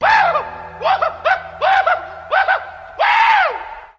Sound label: whoo whoohoo whoo whoo whoo; ooh ahh; ho hoo woo